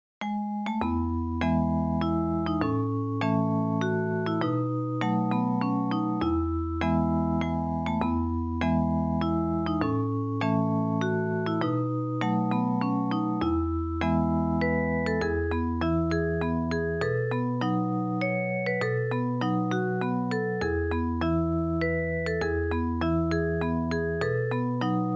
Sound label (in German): simple arrangements emmentaler jodler